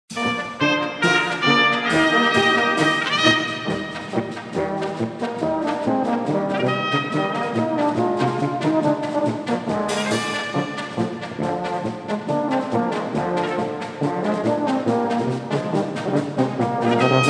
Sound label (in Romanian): Mars, instrumental